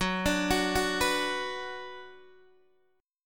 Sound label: Gb Suspended 4th Sharp 5th, Guitar, Open D